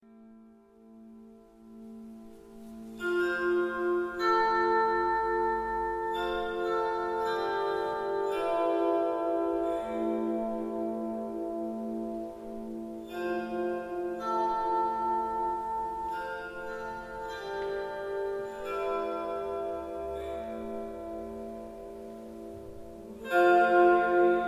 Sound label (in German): Windharfe